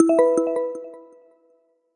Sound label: system notifications